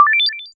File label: confirmation soft, notifications